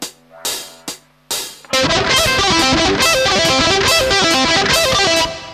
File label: advanced arpeg